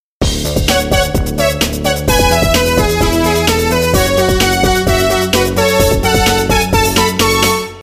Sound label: brass